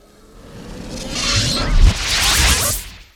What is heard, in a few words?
Skill Nature; FlyForInsanity